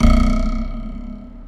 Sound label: Press; OverTheBrink